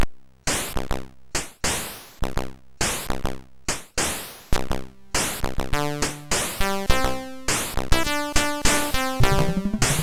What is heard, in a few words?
Super Synth Sample MOS6581R4